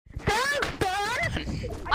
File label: rage